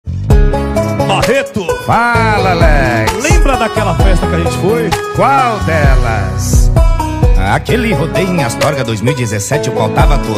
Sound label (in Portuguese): sertanejo com locutores de rodeio